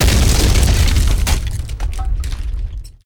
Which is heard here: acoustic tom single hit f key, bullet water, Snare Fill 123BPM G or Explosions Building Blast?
Explosions Building Blast